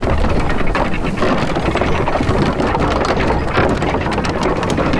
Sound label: Trebuchet Run; Sid Meier's Civilization 4 Complete; Warlords; Units